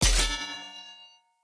Short Sword Medium Parry Perfect Block Impact, Weapon Impacts; Player; Swords; Combat